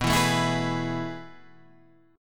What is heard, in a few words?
A Major 7th Suspended 2nd B